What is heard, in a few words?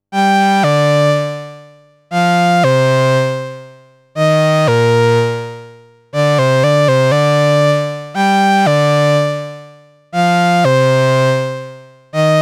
moog lead